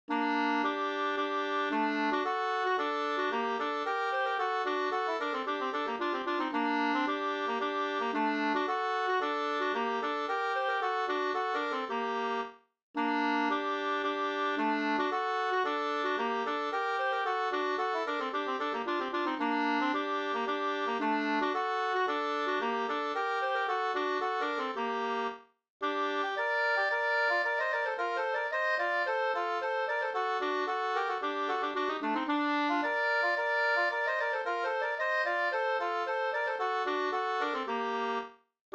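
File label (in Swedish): polska, tvåstämmig; Sundsvall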